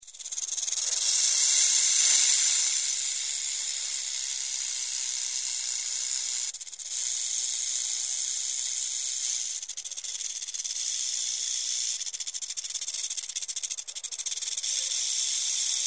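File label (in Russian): gremuchka shypit i trusit hvostom, rattlesnake hisses and shakes its tail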